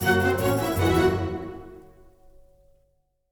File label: Music Fight Brigands Theme Average Bpm160 End; asterix obelix slap them all 2